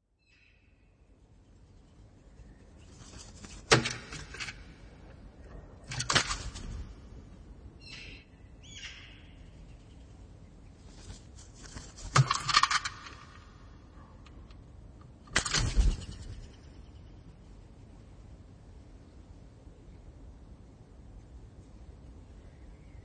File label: Electronica noise bruitist